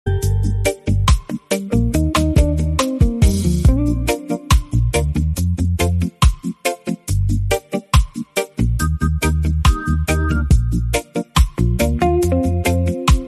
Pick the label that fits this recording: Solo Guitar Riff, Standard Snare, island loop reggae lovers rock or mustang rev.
island loop reggae lovers rock